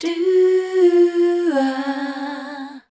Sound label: MELODIC #F, Voice